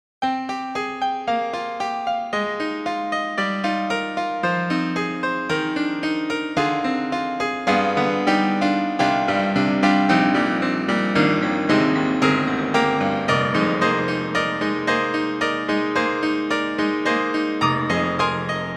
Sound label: Bright Piano; Cakewalk; DropZone